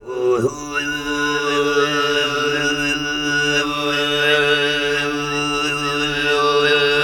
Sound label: TUV GRP, Vocal World Voices TUVAN GROUP; ILIO; Vocal Planet VOL-5